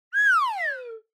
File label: Slide Whistle Mid Down